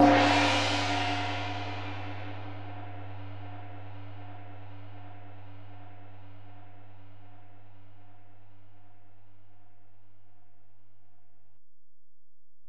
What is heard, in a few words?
Ride, Alesis DM-PRO, Drum Machines